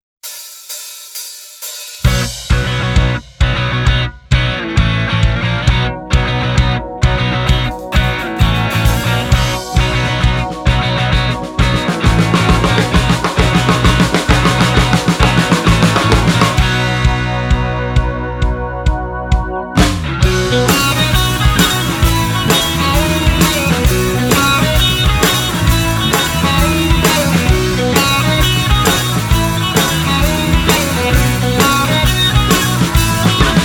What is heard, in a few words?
vintage amps